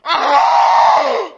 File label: faceless pain